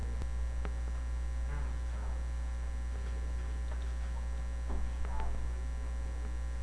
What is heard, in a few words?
basketball